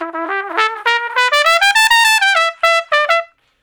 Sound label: Trumpet Shuffle (E); Solos